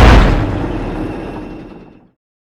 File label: Vehicle Engines; Red Orchestra Ostfront 41-45